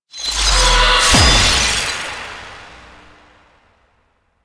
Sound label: Empowered Geode Cast; Warframe; Citrine